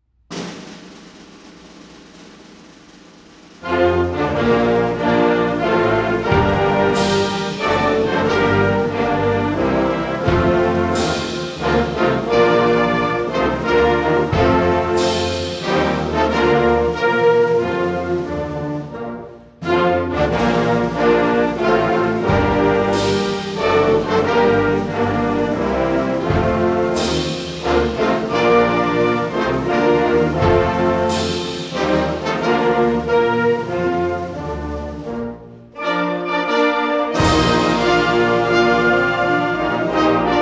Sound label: Band Only, National Anthem; MSHSAA MMEA; All-State Band Recording